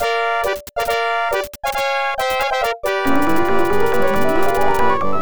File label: Rebel Fighter Arrives; star wars x wing; ibm pc at